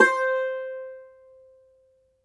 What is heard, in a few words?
cumbus saz C, plucked strings